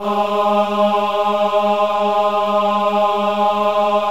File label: VIB G#3, VIBRATO, Voices; Spectrasonics